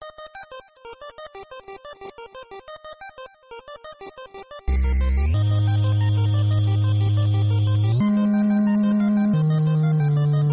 beats

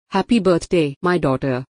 happy birthday my daughter

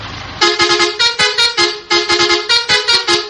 bus horn; maleo

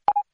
discreet message

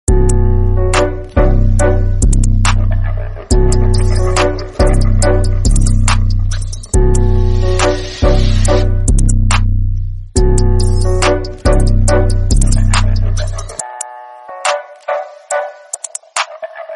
instrumental song; ertugrul ghazi

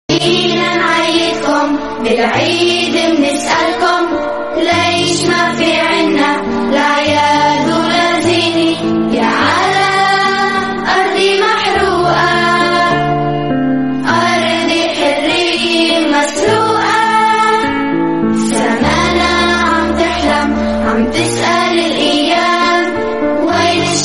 arabic song

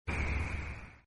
air sound effect

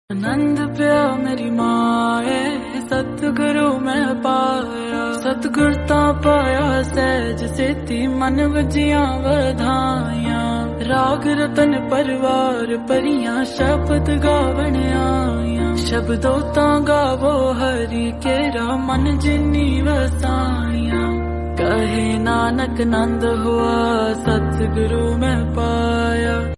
shabad gurbani kirtan devotional; anand kaaraj

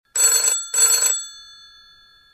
old ring

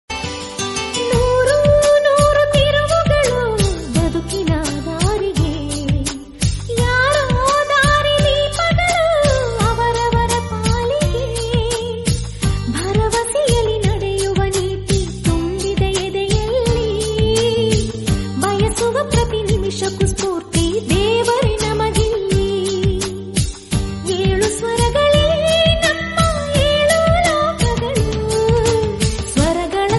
song

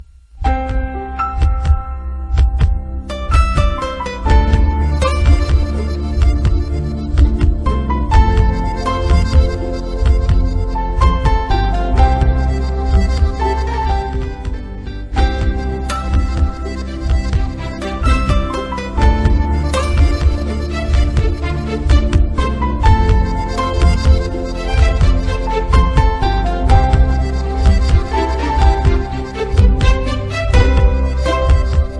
music

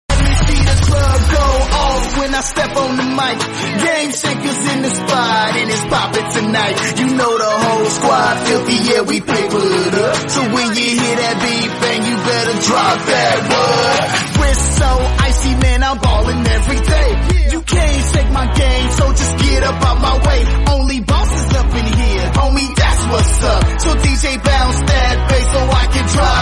show opening theme song; game shakers

